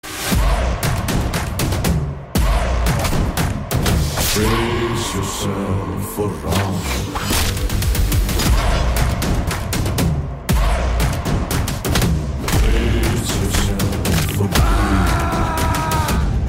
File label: Hindi Background Music Theme BGM; RRR